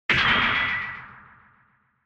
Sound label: Retro Anime Robot Short